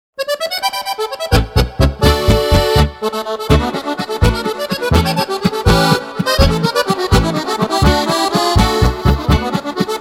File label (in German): Akkordeon, Walzer